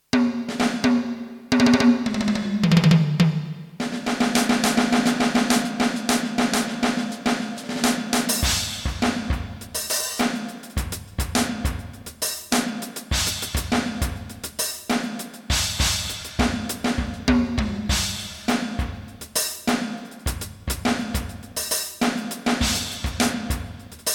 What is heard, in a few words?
ALESIS Nitro Max Kit 04 Cut LowEQ